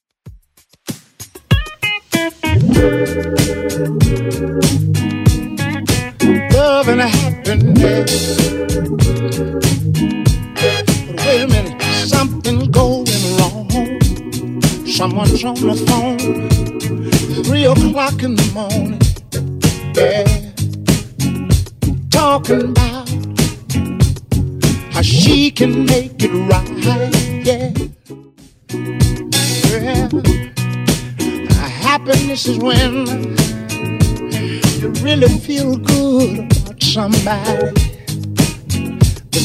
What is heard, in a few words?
70s redrum 96 bpm